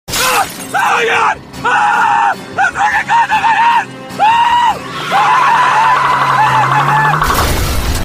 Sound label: ahh oh my god scream; smg4